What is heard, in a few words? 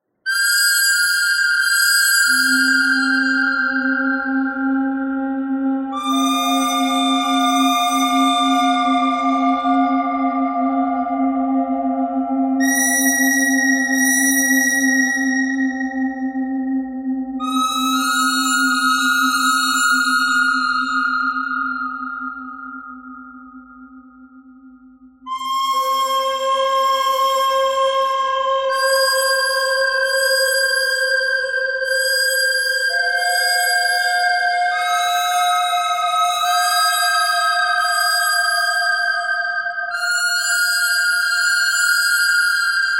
bird thread harmonic